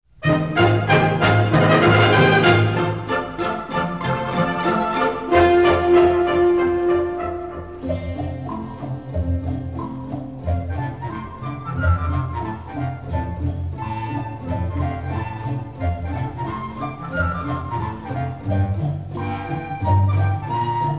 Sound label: Orchestra, Tambourine